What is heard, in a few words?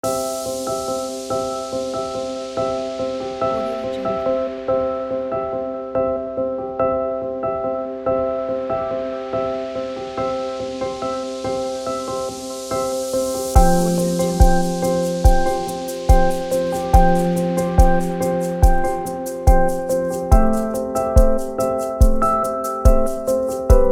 ambient slow